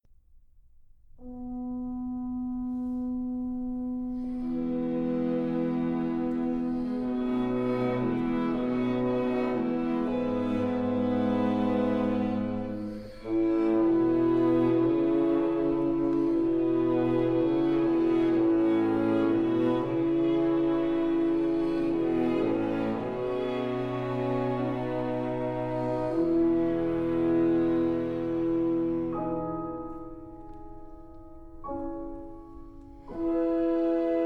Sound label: Divertissement TIMPANI